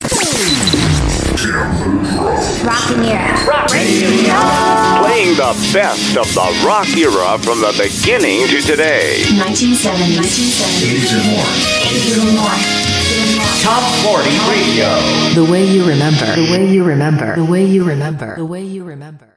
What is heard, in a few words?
Stinger